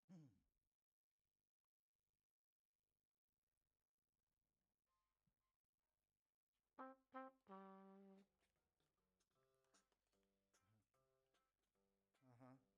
Trump

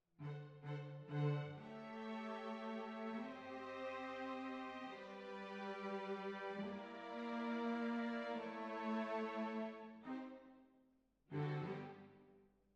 Cbasses

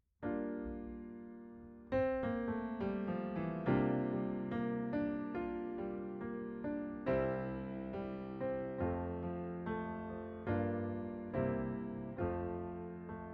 Piano A

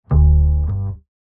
Bass, Jazz for piano

